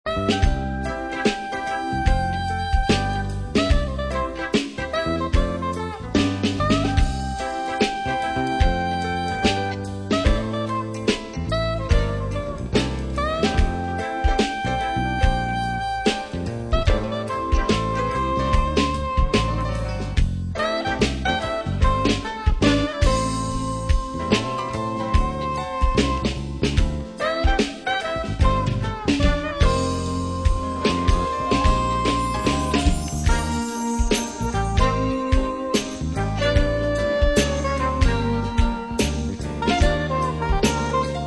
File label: plays soprano